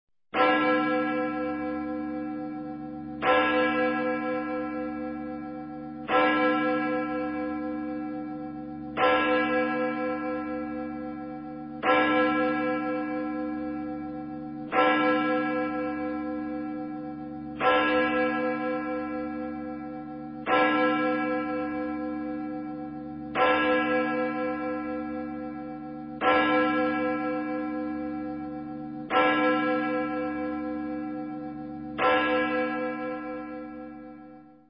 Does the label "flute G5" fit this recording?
No